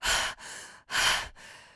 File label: Voices Running Loop; Adam's Venture Origins